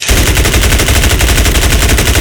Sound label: Weapons Hispano; DCS